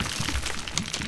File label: Footstep; FMOD; Player